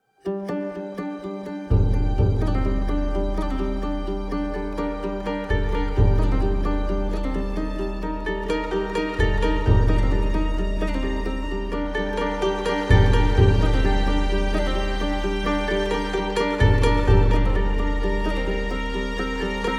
Folk

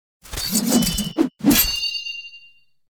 Attack; Lust Princess

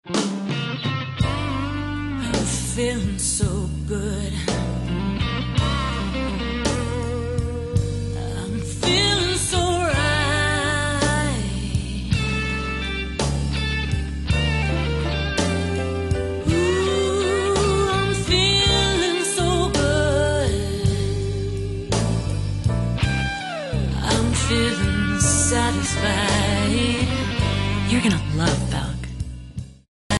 TV Jingle

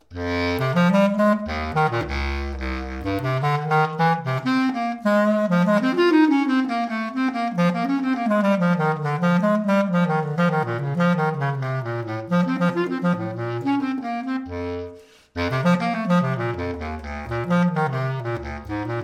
Bass Clarinet Improv

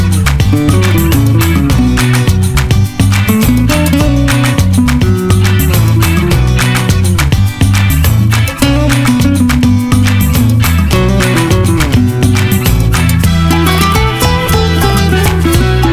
fiesta instrumental

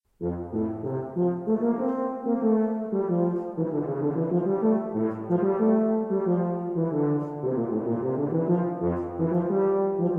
Hungarian March

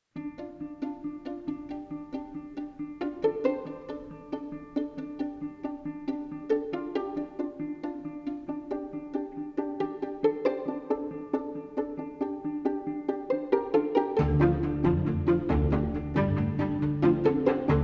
Flugel; LANDR

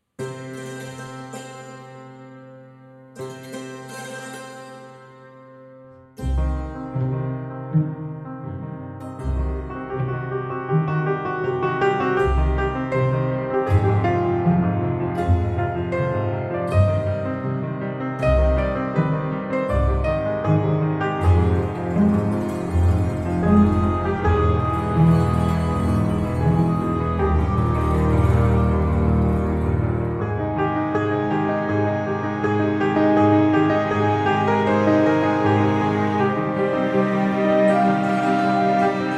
Orchestral

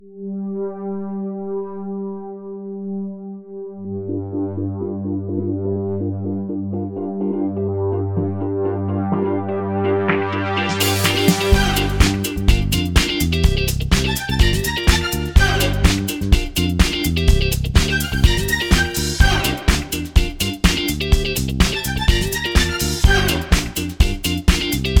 big time disco in G

